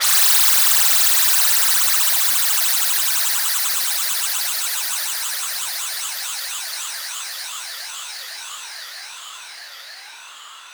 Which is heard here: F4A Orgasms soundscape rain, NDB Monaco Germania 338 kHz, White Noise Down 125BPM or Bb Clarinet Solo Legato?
White Noise Down 125BPM